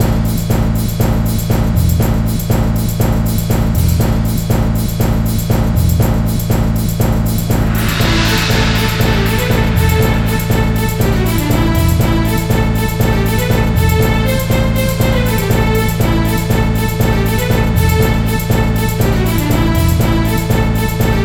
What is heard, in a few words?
boss fight strings